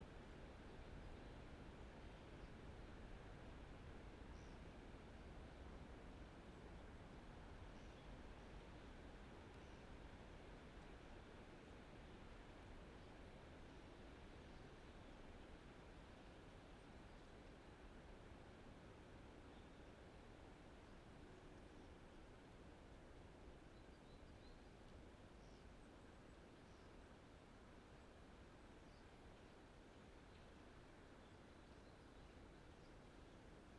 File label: yamanakako sound